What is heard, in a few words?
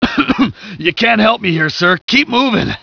generic wounded